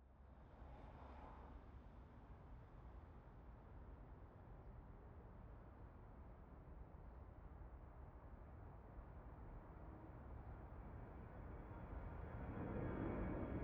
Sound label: Planes Takeoff; aXLsound; Planes - Takeoffs and Landings